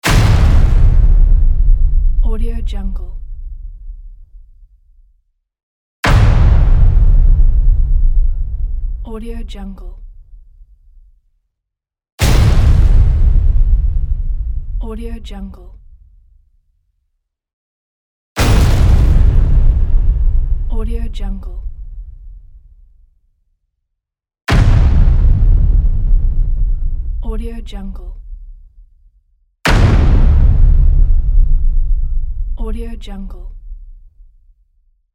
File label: big drum hits